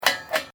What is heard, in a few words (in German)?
Metallttuer oeffnen